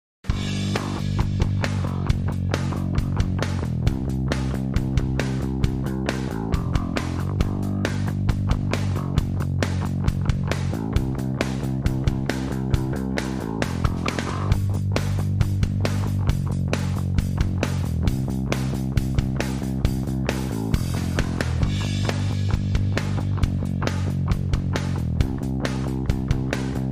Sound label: drums bass